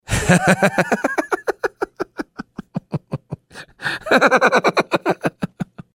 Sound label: laugh track